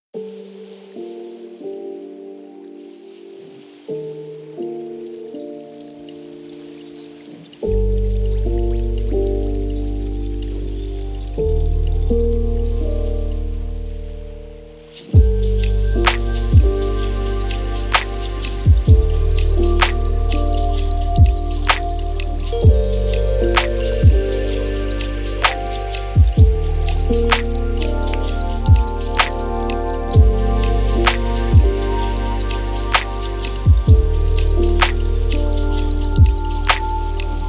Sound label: lofi hip hop